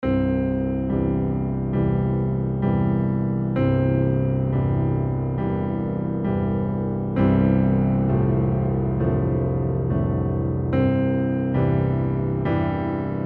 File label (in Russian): Колокола